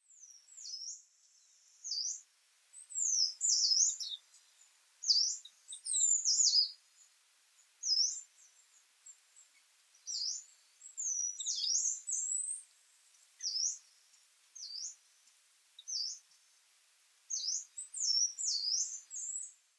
kimayumushikui, mishima, koe

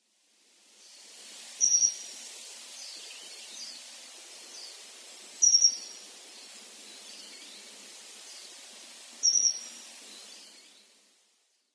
yamahibari, sakata

